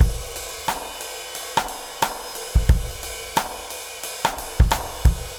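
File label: 89 bpm, beat loops; Zero-G; Monster Beats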